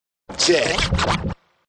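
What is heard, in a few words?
Scratch Check, Pro DJ scratch; Musical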